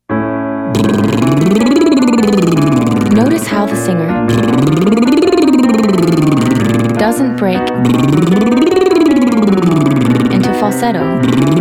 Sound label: Exercise Longscale Liprolls males